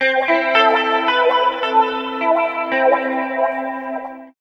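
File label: guitar, left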